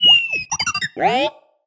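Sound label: Sci-Fi Droid Robot Emote; jasem just another shooter with electronic music windows 2017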